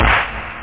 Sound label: dzing, drums snare; OctaMED SoundStudio V1